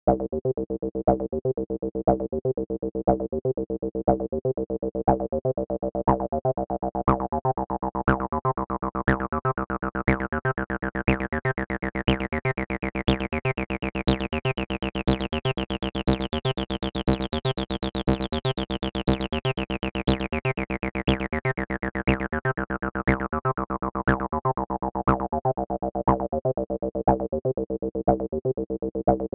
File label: TB-303 Square; ROLAND CLOUD